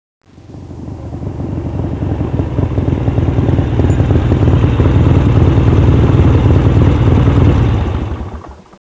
harley; Virtual Pool Tournament Edition

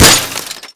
Sweetner Glass, impacts; Totaled; XBOX

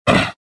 land; Star Wars Jedi Knight II Jedi Outcast; XBOX; desann

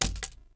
blaster hit concrete; objects; Star Wars Obi-Wan; XBOX

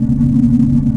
drone loop, engine; ships; Quantum Redshift; XBOX; yuri

